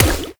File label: Water Sprayer Punch Layer; Warframe; Weapons